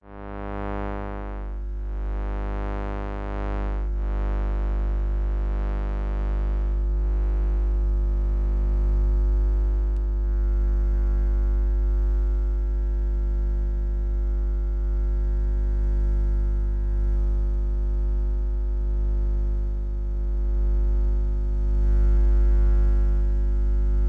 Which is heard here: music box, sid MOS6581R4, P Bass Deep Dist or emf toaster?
emf toaster